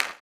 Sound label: CLAP, blues & soul; ILIO; Vocal Planet